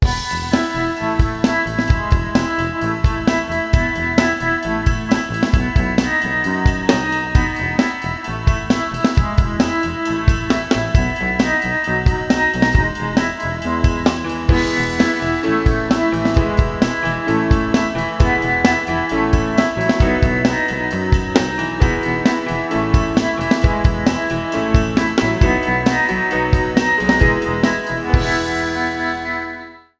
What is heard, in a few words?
no ac gtr